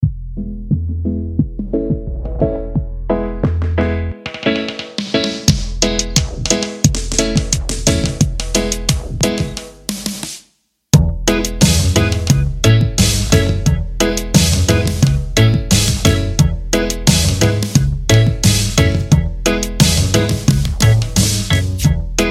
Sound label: reggae instrumental